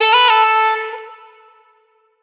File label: Vocal one shot A; Slate Digital Omnivox Vocal Sample Pack